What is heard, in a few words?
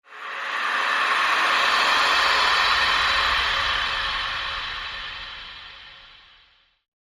Transition Smooth Swell